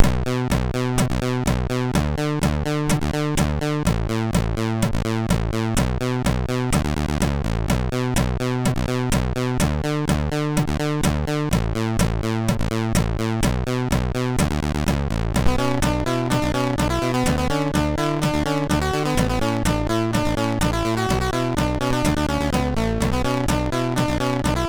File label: sid MOS6581R3